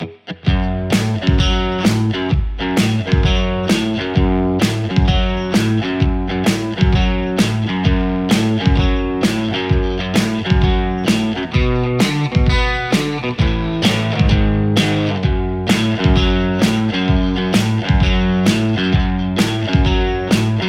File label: strat anvil; igniteamps; anvil v2 01